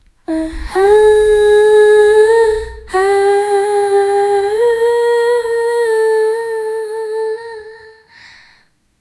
suffering girl voice

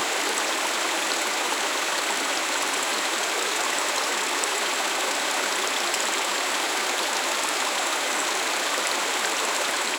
stream of water in forest

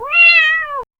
cat sounds, feline

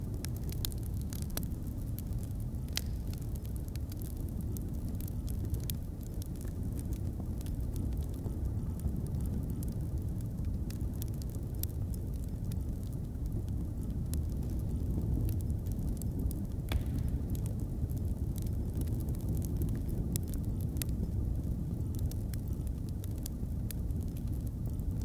soft fantasy forest ambie; campfire

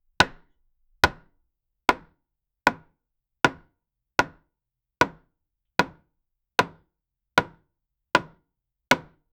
a wooden block hitting

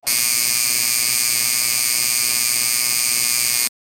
old intercom buzzer sound; communication

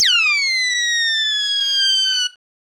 laser shooting

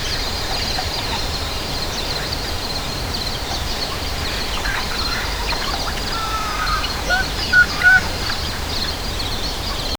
peaceful river sound

